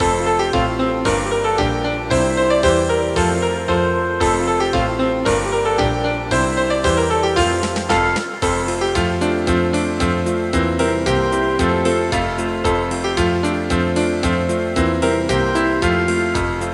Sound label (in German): zirkuslieder playback